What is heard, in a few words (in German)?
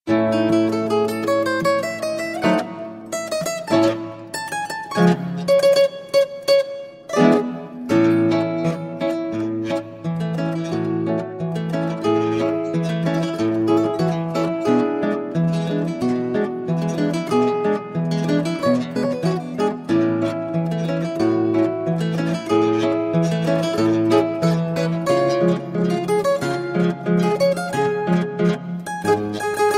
Marsch; Hoch Germania